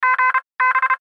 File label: Radio Morse Code; Good Bye Gb; Warfare; Blastwave